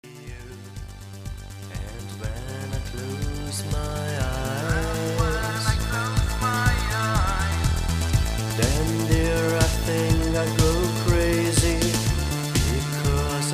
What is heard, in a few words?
silent scream